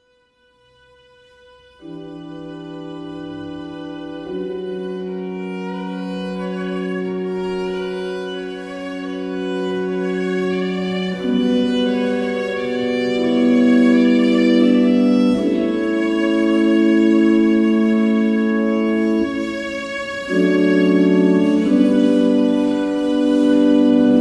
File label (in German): Adagio, viola mit klavier